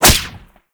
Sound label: Whip Impact Default; Oraxia Whip